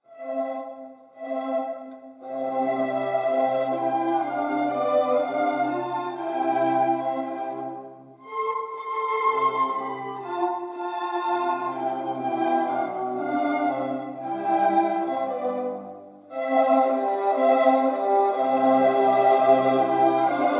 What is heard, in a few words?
V shape music